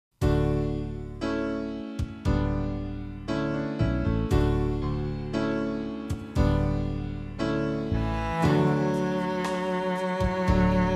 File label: Ambience Cello